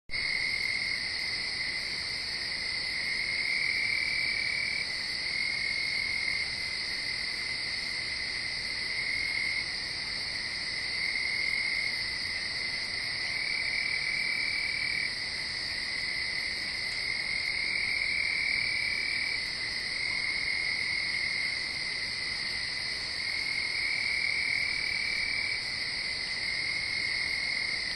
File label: crickets, nature